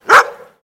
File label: Dog Bark Generic Clos